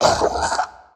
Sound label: poisonmind hurt